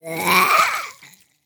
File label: Tar Creeper Death